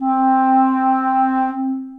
Bb Clarinet Section Legato Vibrato, Orchestral Woodwinds; Ableton